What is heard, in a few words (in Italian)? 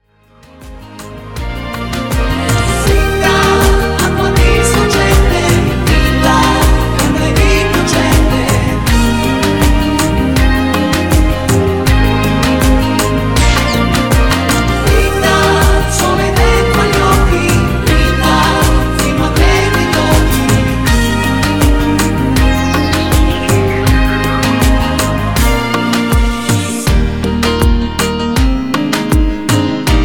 Kizomba Canto